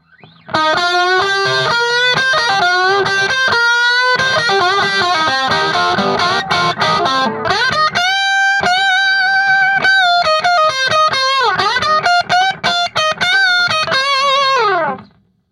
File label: falling solo